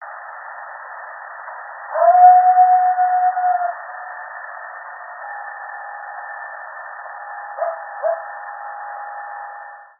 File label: Coyote